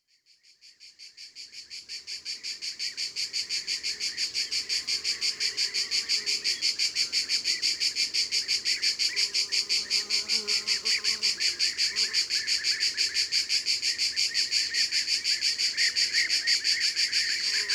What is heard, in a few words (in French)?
Cigales Communes; Ardeche; Concerts Naturels De l'Ardeche